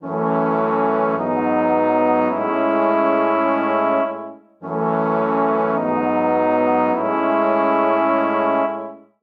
Progression Victory Turnaround [I-ii-iii] - [iii-vi-II-V] F# Minor 104bpm, Brass Quartet; MIDI Clips; Ableton; Spitfire Audio